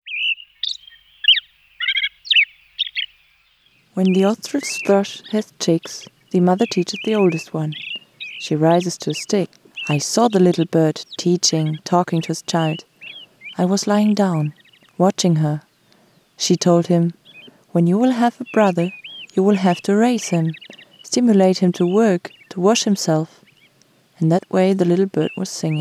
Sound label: Austral Thrush; Birds